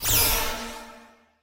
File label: pulse; 芬克斯; 神话